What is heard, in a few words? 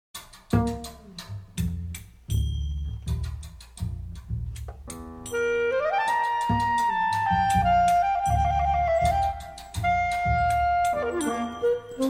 Chinese dance; Chinoiserie Act II Divertissement XII. c. Tea